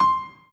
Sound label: Piano Chord